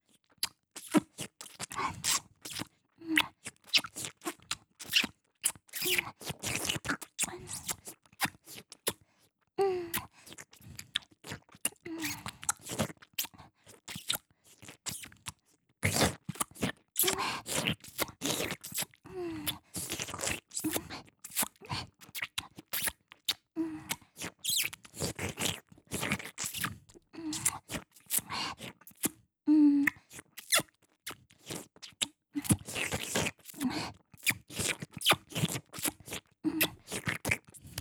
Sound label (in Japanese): チュパ音